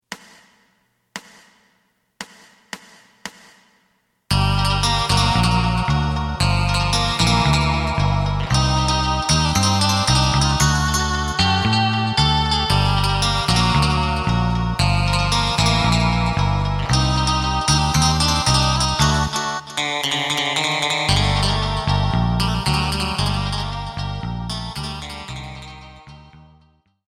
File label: drum